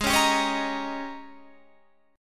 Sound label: G sharp Major 7th Suspended 2nd Suspended 4th